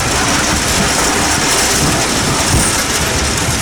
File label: Auto Tires Scrape Wall Loop; Automobiles